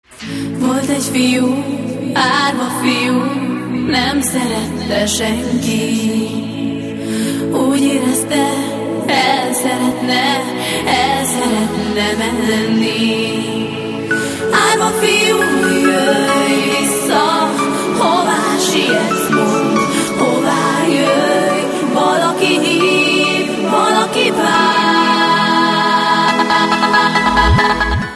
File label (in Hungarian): csengőhang; magyar pop